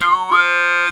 "do it", Talk Box

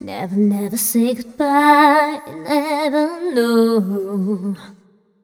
f mel a flat, fem Vocals; Mutekki Media; Diamond Vocals 2

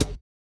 GLASS; Spectrasonics; Metamorphosis; GLASSBABY

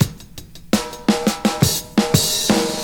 FILLS, 70_s Breakbeats

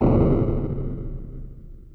Explosion, Yamaha DD-35

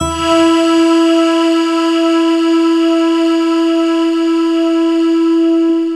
Dream Pad (single note)

